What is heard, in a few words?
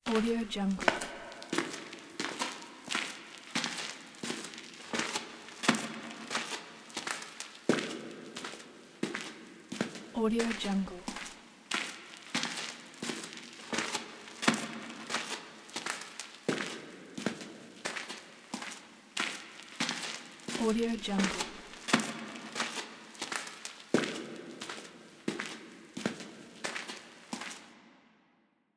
footsteps in the cave